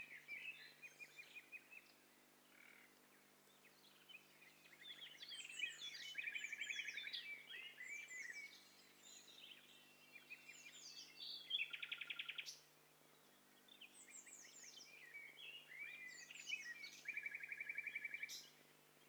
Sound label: STEREO BIRD COCKTAIL PARTY